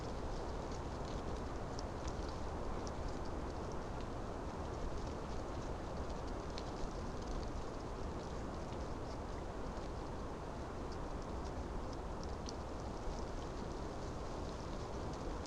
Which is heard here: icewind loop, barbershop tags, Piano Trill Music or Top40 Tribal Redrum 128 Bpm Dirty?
icewind loop